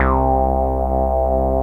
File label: ODY, ARP Odyssey